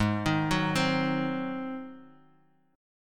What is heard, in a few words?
G sharp 7th, Guitar, DADGAD; down; chord shape